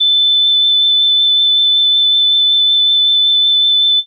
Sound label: Pan Flute DX100 A6